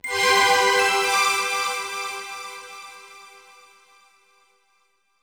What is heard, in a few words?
Paraglider Jingle; Strawberry Shortcake The Four Season Cake